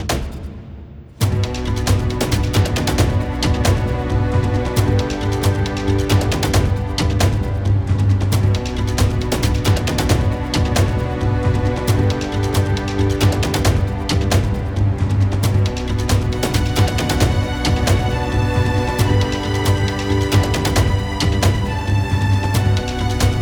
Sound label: tension; by burak sound bank; tension gerilim vol 47